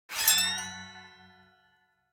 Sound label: Signature Charged; Stereo